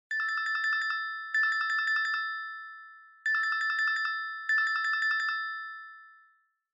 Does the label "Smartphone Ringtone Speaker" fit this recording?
Yes